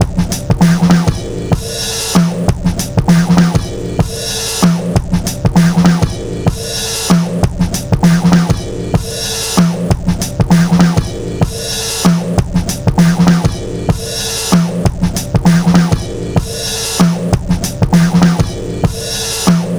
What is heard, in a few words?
97 bpm; CATCH 22; 90 s Sample CDs; Planet of the Breaks